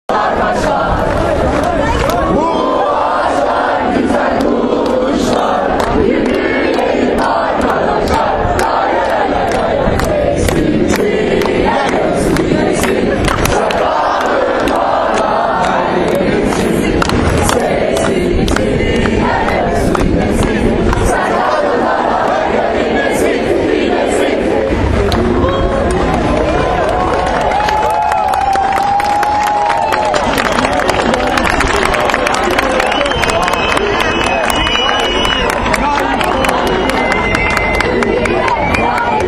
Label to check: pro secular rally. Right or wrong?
Right